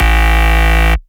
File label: Bass Shot A; Mutekki Media; Vengeance Electroshock Vol.2; Bass Shots